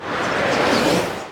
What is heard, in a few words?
Magician Preparemagic